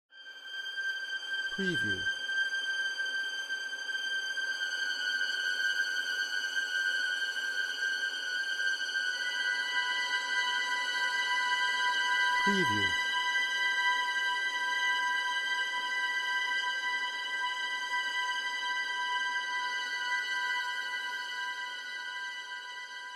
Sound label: Eerie Strings